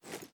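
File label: assault rifle reload empty extended magazine arm; weapons; gmod; viper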